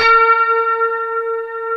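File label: B 4 harmonic, guitar; Spectrasonics; Hans Zimmer Guitars VOL-1; 90's Sample CDs; HYPEMONICS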